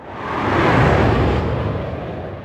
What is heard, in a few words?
missile swing; 3D-Airforce-Simulator